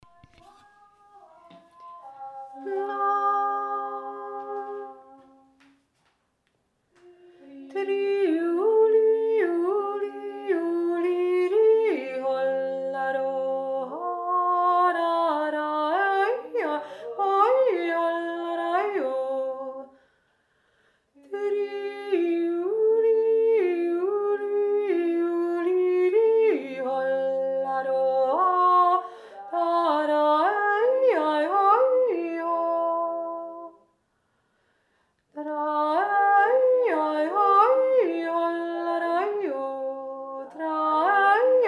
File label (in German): tri u li obere stimme